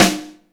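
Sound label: SN, snare; AKAI S-Series CD-ROM Sound Library VOL-2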